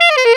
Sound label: JAZZ CLUB